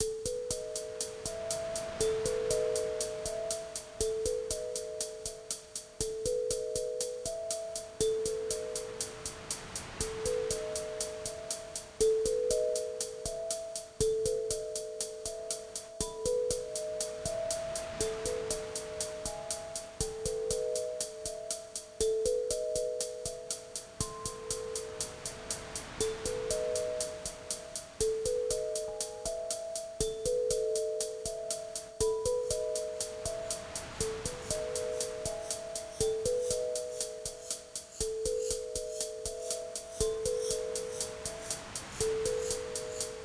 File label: Heart; mermaid adventures the frozen time